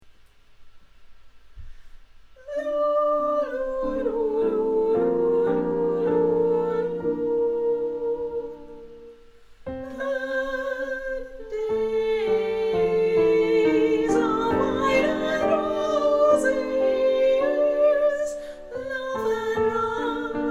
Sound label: Tenor